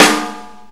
Acoustic Snare; Digital Sound Factory Xtreme Lead

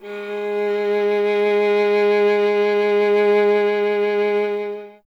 viola G; Miroslav Vitous; Solo Instruments VOL-1

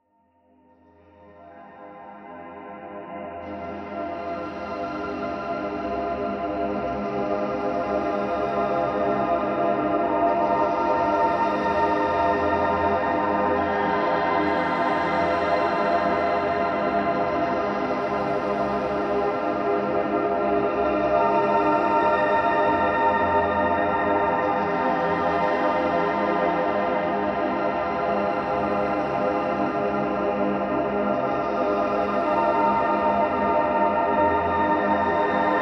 ceiling pad

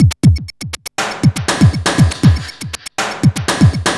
Beat, Industrial

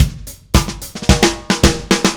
Drums Fill, Indie Rock; Session 01 - Brighton; Sonic Foundry (Sony Creative Software); Indie Rock Anthems

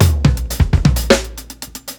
120 TOM FILL, DRUMS LOOPS; BASEMENT DRUMS VOL 2

